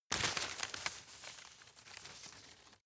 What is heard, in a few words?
grab rustle medium